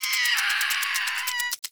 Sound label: Initial Roar